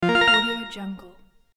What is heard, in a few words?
bright retro confirmation menu sound